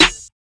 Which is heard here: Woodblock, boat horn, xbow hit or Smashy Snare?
Smashy Snare